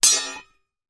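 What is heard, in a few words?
warfare sword hit another fight wobble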